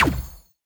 sentinel Wisps attack; heroes nyru

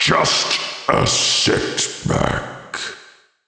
death; bonehart

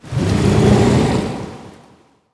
boss spawn

